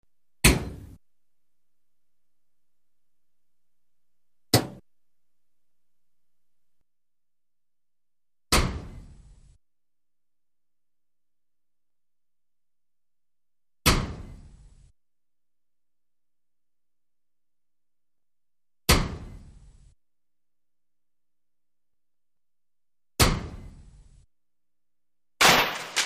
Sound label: Axe Hit Car Hood Crea; crashes